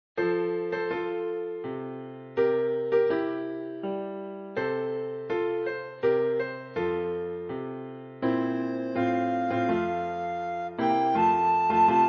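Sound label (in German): O Tannenbaum Blockflöte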